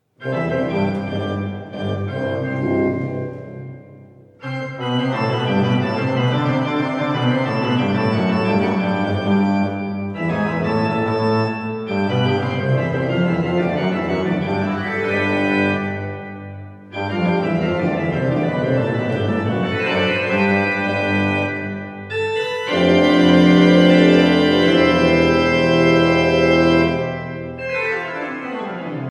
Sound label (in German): Präludium Fuge und Ciacona C Dur